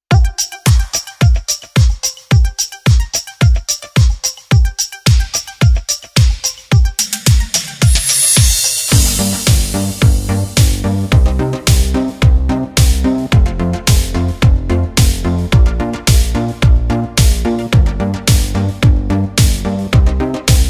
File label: relaxing music instrumental korg style pa4x; martina style